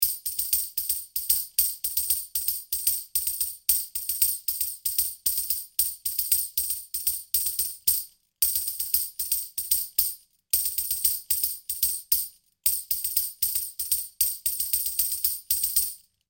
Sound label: Jingle Percussion One-Shot Samples; pieropeluche